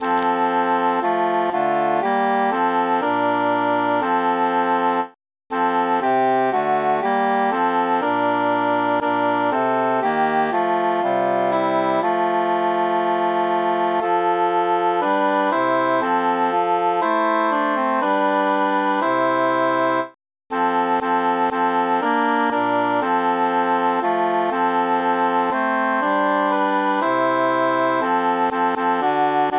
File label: altus mute; o occhi